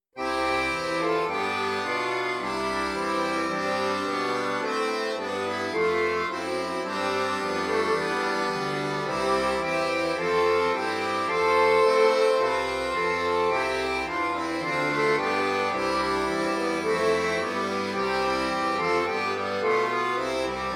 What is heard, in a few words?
Alle Jahre wieder playback